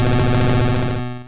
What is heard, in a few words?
Explosion; Pokemon; Gen 1; attack moves; RBY